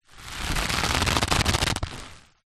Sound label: foley rope stress tighten creak; zapsplat